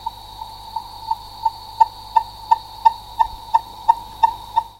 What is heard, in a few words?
Skype Feedback